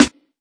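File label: snare, Classic Hip Hop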